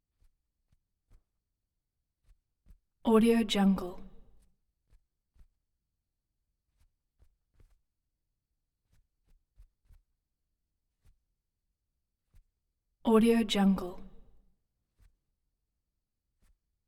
int collar adjustment; collanderson

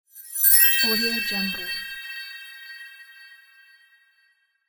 magic wand; volkovsound

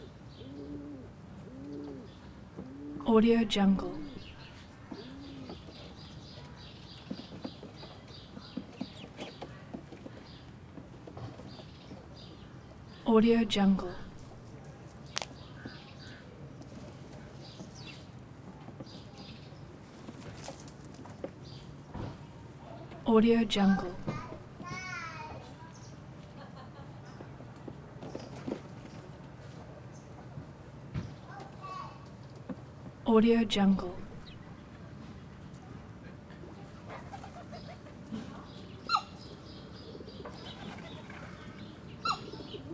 ambience farm pigeons hens turkey kids; soundbits soundfx

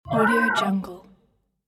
robot emotion sfx; jbobstudio

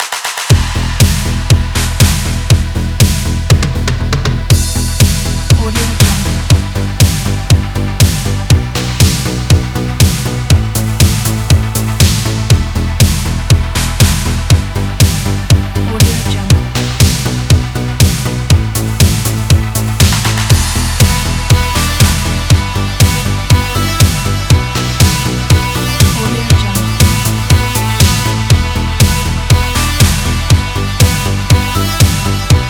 the 80s

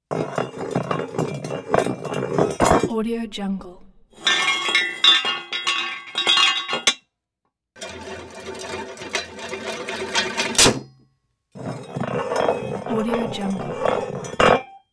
crown roll on side come to sudden stop smooth

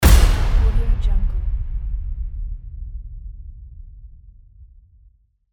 trailer impact

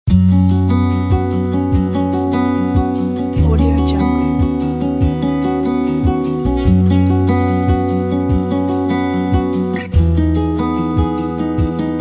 folk guitar ballad

